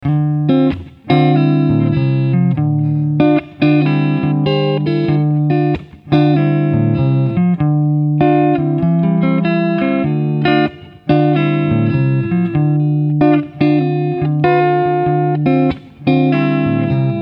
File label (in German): hagstrom super viking hals pickup clean